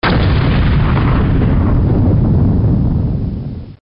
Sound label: explosion grenade